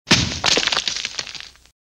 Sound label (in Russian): udar i padaet shtukaturka; Звуки строительных работ; снос зданий; Звуки строительных работ обычно связаны с разрушениями, такими как снос зданий